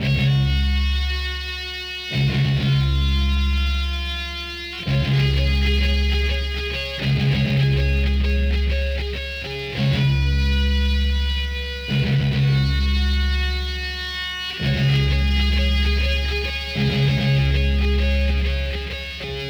Guitar; Matt Hoffman's Pro BMX 2; XBOX

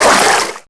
water, footsteps; characters

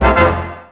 Win

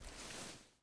body slide gritty, foley

